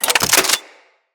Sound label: Rifle Reload; Weapons; Bolto